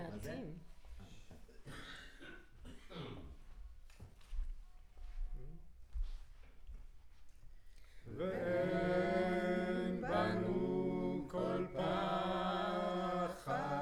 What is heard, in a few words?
Center u47, Choir